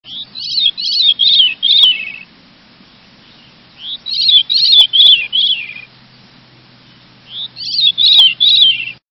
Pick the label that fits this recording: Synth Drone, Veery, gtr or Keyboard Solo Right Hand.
Veery